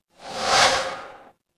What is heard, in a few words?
ui swipe